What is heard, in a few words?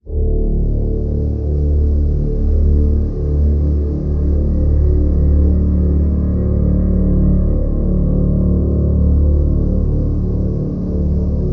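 drone D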